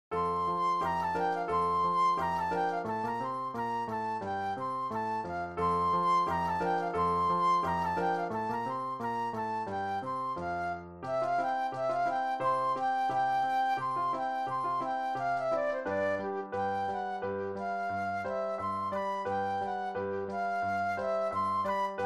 Musette Flute Solo; classical; Bach; BWV 126

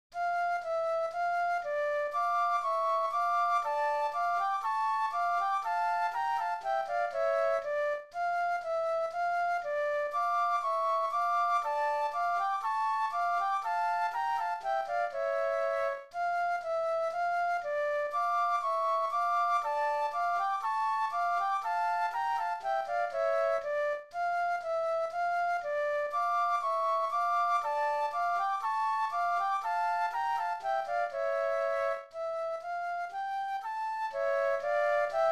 Gavotte Flute Duet; classical; Boismortier

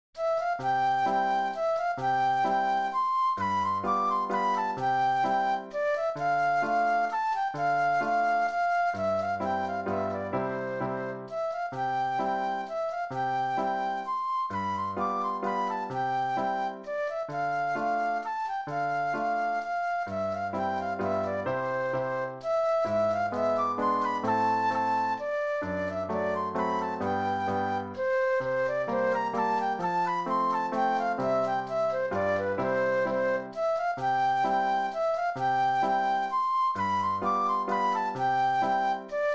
German Dance Flute Solo; classical; Haydn; Hob. IX 12 no. 4

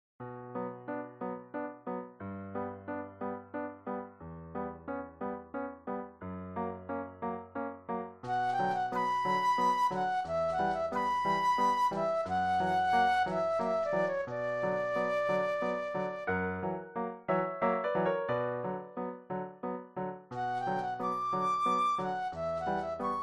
Serenade Flute Solo; classical; Schubert

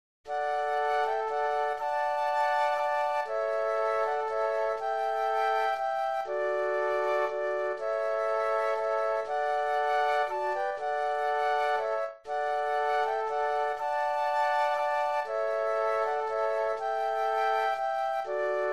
Sonata Flute Trio; classical; Mozart; K. 331

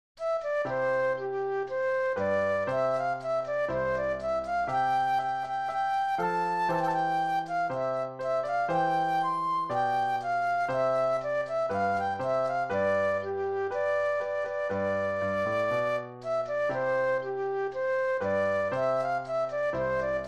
Rondeau Theme Flute Solo; classical; Mouret

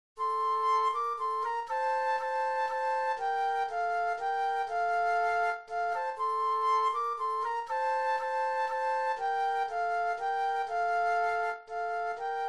Suse Liebe Suse Flute Duet

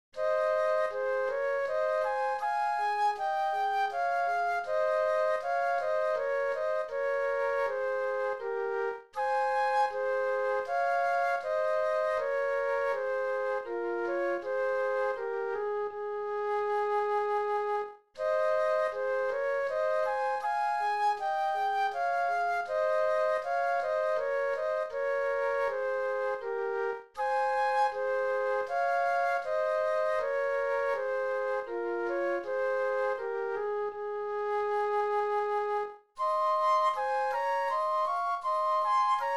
Minuet Flute Duet; classical; Gluck; Wq. 30

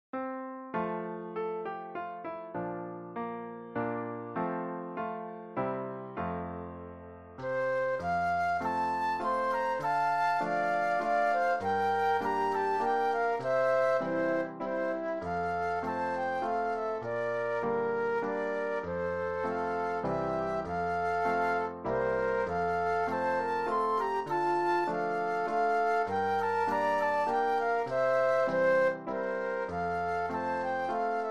flute duet and piano; The Ash Grove

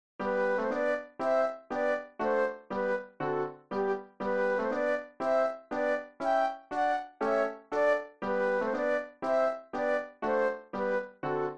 march flute duet and piano; classical; Schumann

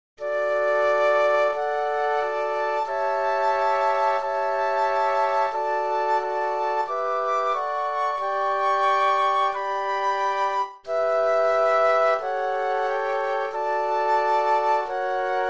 Flute Quartet

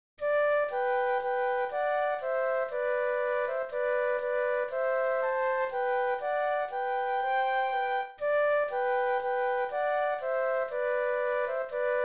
Flute Duet

